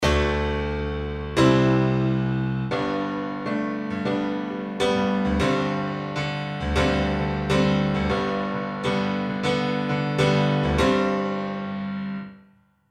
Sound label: piano